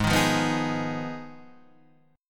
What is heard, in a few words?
G sharp Minor 6th G sharp down